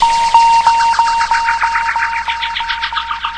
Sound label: hed echo, synth